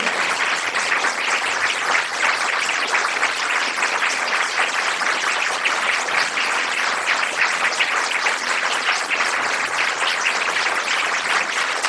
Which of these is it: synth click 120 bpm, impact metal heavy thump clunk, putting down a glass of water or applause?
applause